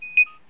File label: nokia 3510i volatile ringtone